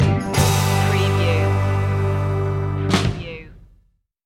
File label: vintage blues stinger